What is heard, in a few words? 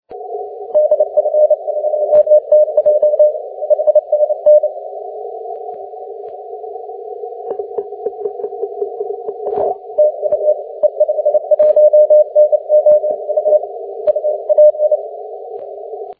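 12m continuous wave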